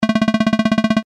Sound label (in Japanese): 会話